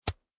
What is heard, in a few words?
kick; chicken